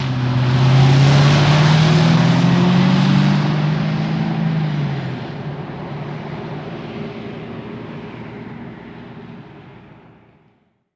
Cadillac Accelerate

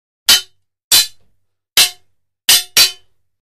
Swords Broad Hits; clangs

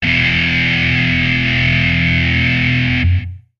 Music Dist El Guitar

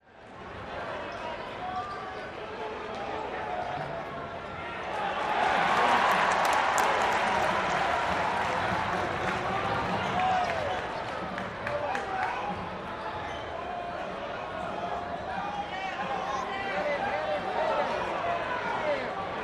gym crowd cheer, cheers

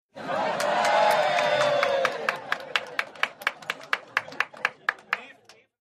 100 people applause outdoors, short & polite; medium

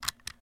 Cassette Play Mini, Cassette Player

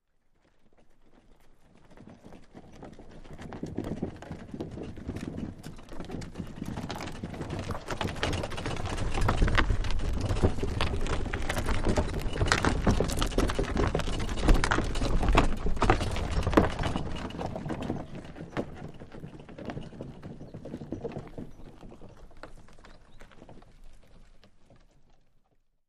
Mule Cart Walking, carts

